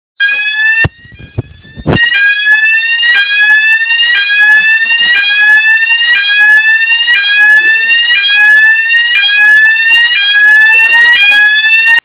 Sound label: alarm; Rockbox devcon 2011